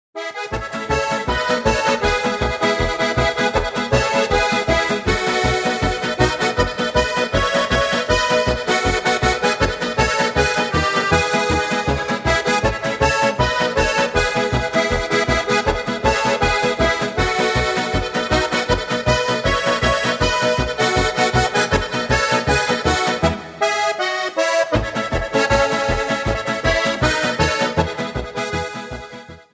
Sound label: Martin Polka